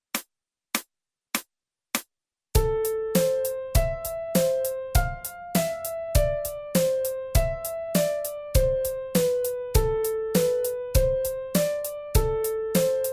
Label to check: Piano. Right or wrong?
Right